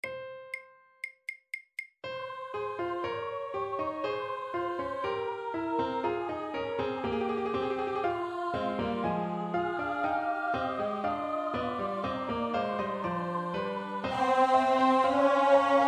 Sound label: Amen Tenor; Full speed; 13. Amen (Tenor, Full speed)